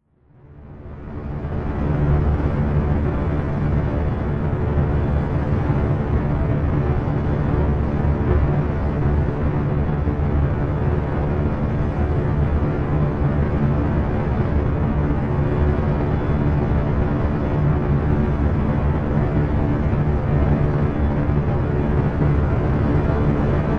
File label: Starcruiser SciFi Spaceship Exterior Idle Overhead; Star Cruiser Spaceship Exterior Idling Overhead Hum Of Motors; Spacecrafts